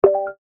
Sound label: screen selection home; phet io wrapper sonification